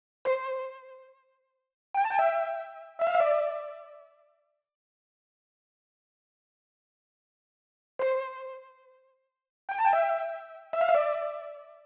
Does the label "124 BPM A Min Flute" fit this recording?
Yes